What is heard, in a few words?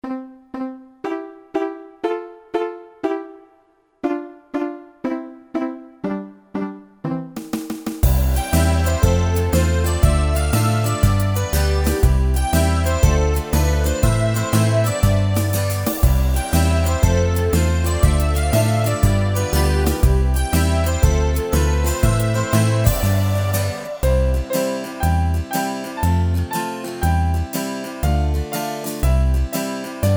Karaoke